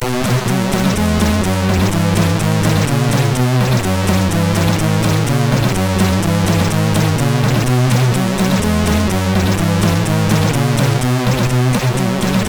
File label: sid, MOS6581R3; Twincle